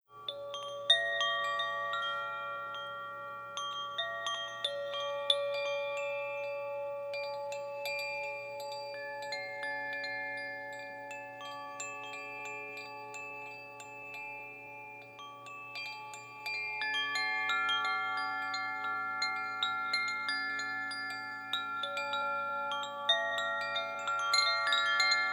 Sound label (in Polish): magia zywiolow, aqua woda, 432 Hz